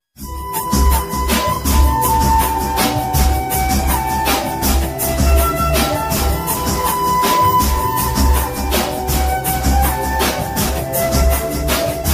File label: Bass Rythm